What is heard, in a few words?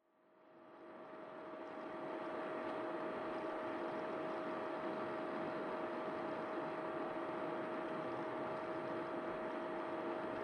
PC Operating Noise Long